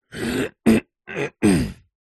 zvuki pershenie v gorle kkhm

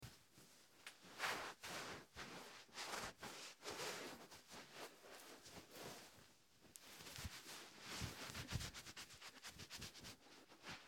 zvuki vafelnogo polotentsa

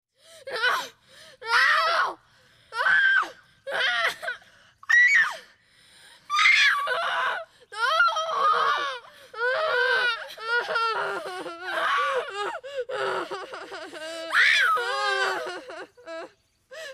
zvuki stradaniia cheloveka

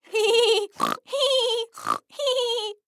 zvuki zhenskogo smekha

